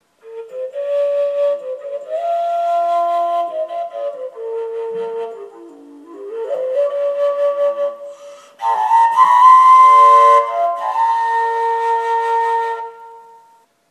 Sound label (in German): Kupferobertonflote